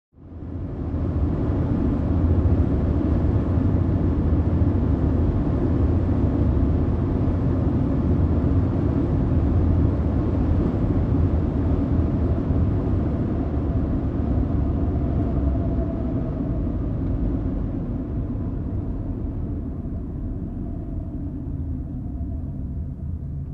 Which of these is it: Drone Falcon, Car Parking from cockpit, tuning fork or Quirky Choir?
Car Parking from cockpit